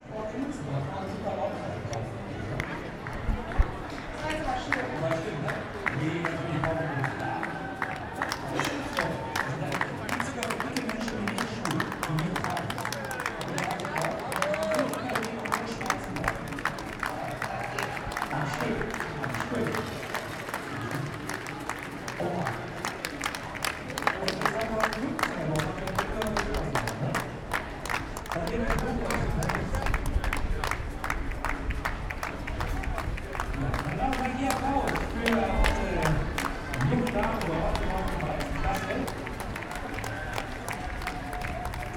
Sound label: Marathon Germany Medium Cheering Clapping And Rattle